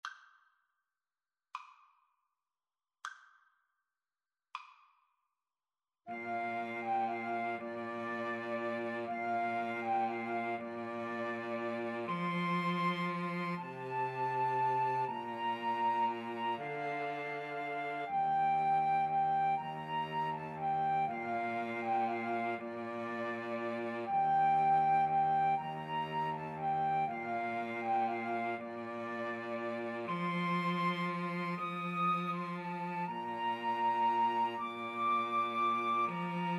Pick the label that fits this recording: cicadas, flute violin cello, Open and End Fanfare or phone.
flute violin cello